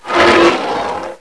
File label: Red Skull Attack